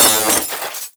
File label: SOUND EFFECT, Metal; AKAI S6000 CD-ROM - Volume 1